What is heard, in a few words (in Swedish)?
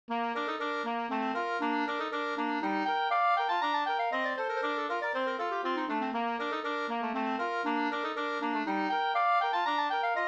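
slangpolska, two-part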